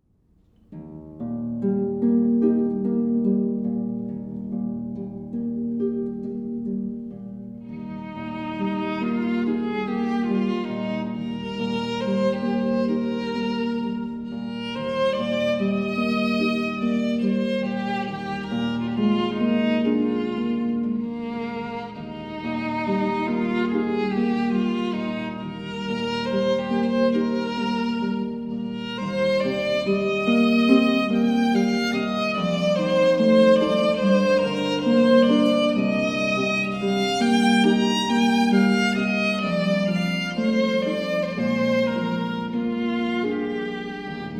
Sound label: Carmen, Violin & Harp Wedding Music; violin-and-harp-duet